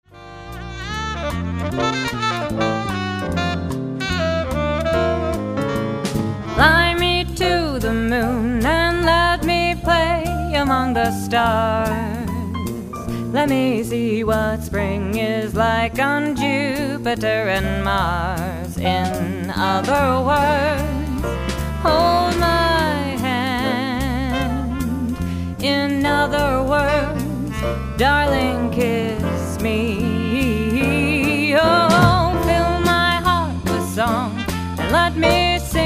Quartet, jazz band

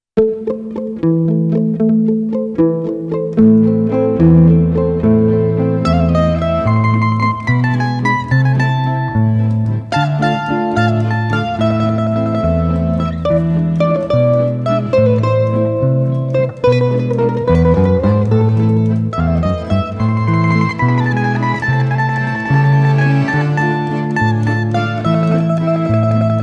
guitar; GQ Guitar Services

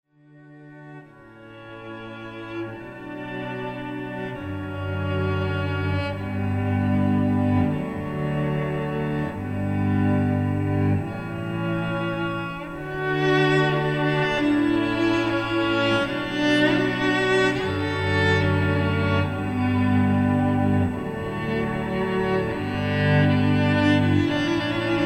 live looping, cello; Canon in D

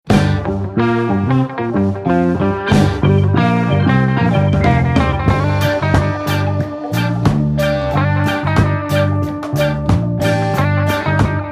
cover band

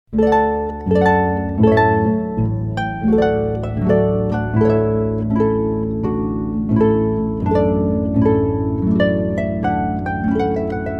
Trumpet Tune, Harp; Classical Harp

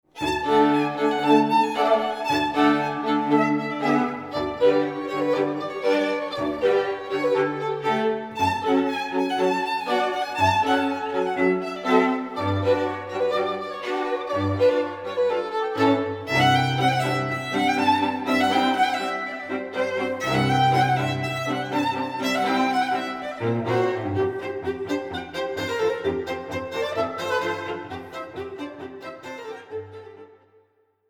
string quartet

